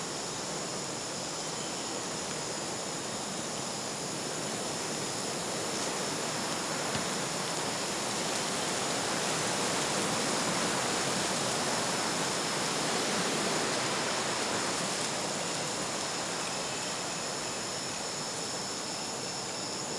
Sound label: ambience forest